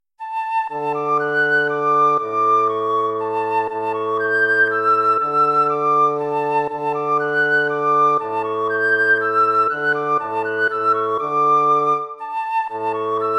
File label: waltz; Carulli Ferdinando